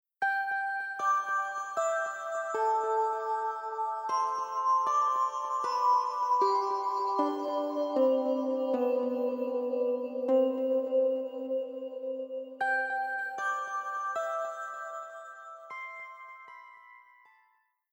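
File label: Future Bass Melo Delay Celesta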